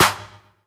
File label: SD HOU, Dance Mega Drums; Best Service